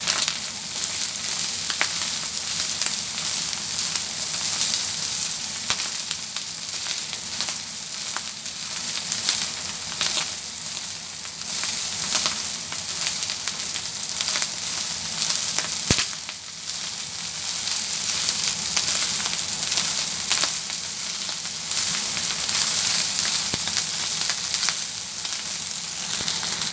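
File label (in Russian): жарка риса на воке. очень обжигающий звук а еще вкусный обед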